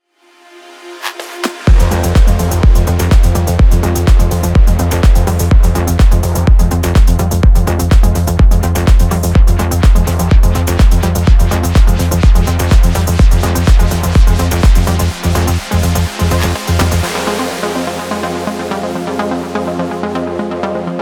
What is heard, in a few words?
Radio Mix, progressive track with an edge, complete with a driving bassline